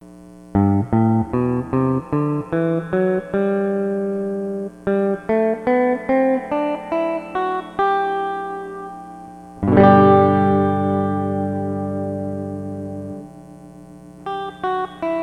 Weber 5G15 Reverb G Major, guitars; Pedal Effects

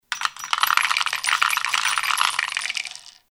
12mm dice; Campanile Dice Tower

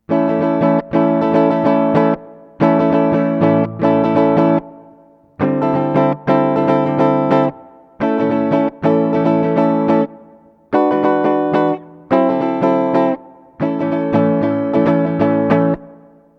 Reverb, guitars; Pedal Effects; BYOC

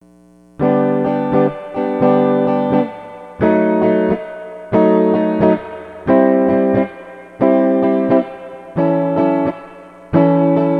Reverb, guitars pedal effects

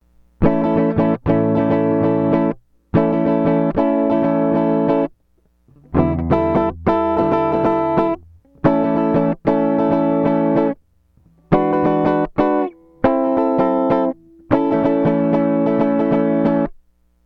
Markbass Compressore, guitars; Pedal Effects

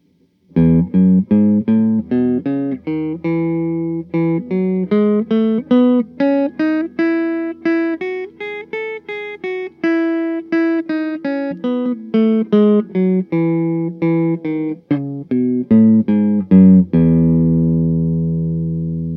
Clean Neck, guitars; Ardmore Build

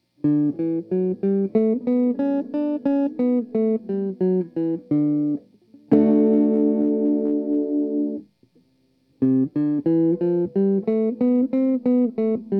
Clean Neck, guitars; Vox Mods

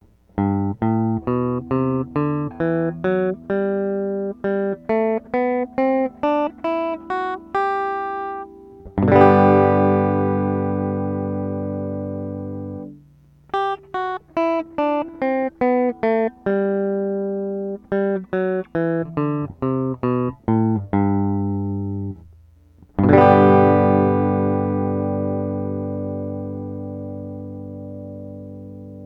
Roger Mayer Tremolo Bypass G Major, guitars; Pedal Effects

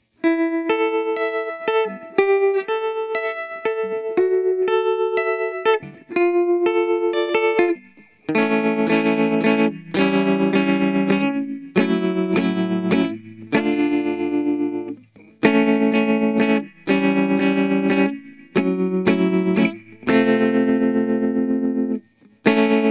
Reverb Tremelo; Vox Mods

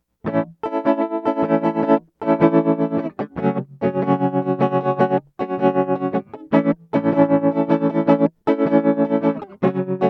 Roger Mayer Tremolo Max, guitars; Pedal Effects

